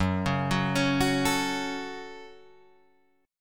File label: F sharp Minor, Guitar, Drop D; chordshape; down